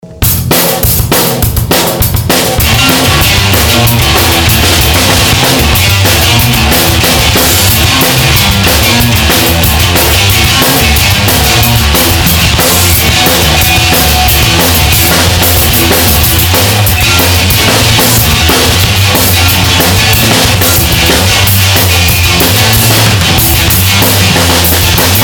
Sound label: riff